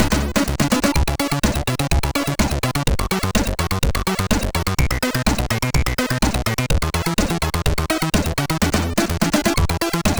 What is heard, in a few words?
Disco, MOS6581R3